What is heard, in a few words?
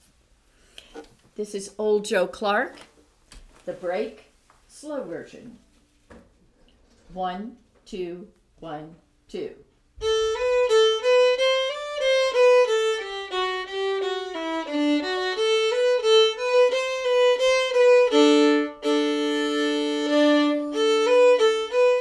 Old Joe Clark break slow version